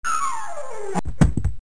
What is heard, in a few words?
Sliding